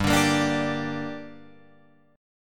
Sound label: G Minor G down Guitar Open F, chord; strummed; JGuitar